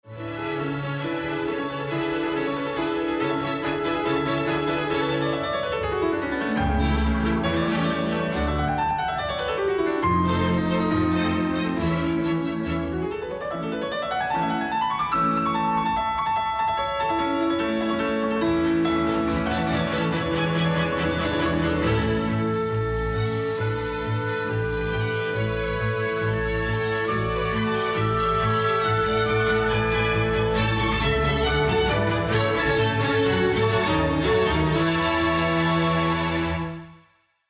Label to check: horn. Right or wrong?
Wrong